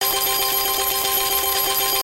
collect; Hot Fruits 100

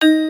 step; Diamond Cats

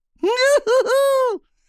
laugh; Racetrack Riches; megaboard

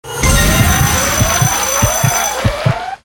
megawin; Ultra 7; slot

